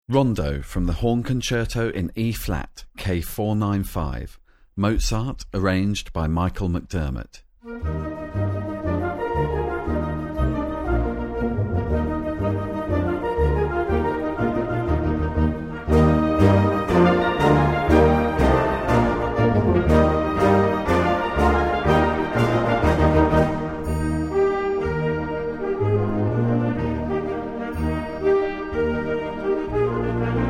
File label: Rondo from Horn Conc, wind band